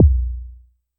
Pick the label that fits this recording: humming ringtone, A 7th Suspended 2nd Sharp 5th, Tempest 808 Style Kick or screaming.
Tempest 808 Style Kick